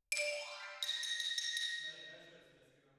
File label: Twin R Xylo